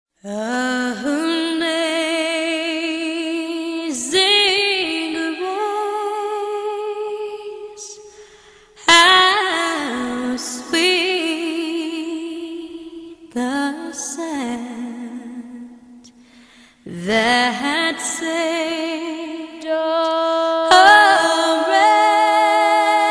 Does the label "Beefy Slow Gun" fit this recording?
No